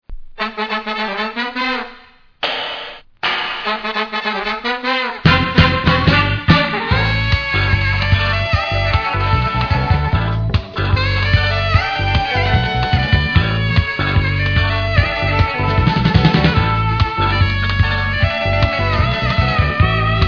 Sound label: beat